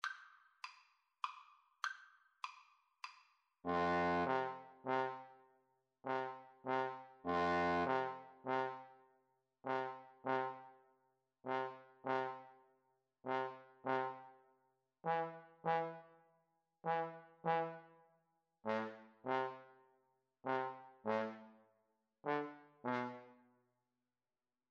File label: Trombone Duet